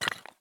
walk tile; Escape from Tarkov

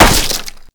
impact wood 20mm; weapons; Modern Warfare

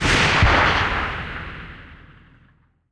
12mm tails far, guns tanks; War Thunder

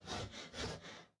breathe; Rust

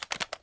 rifle plastic magin fail; Escape from Tarkov

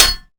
metal small

